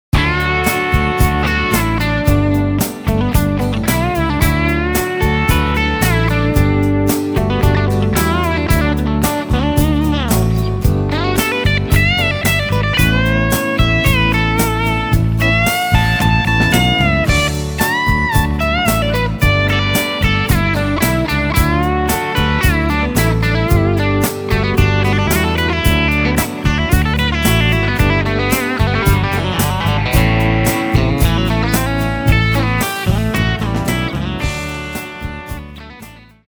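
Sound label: country echo; ME-80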